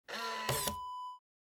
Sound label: camcorder door open